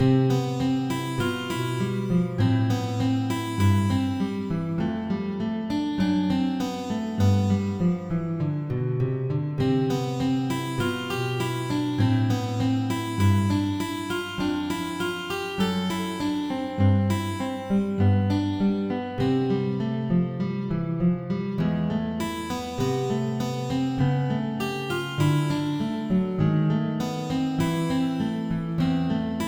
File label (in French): Etude en La Min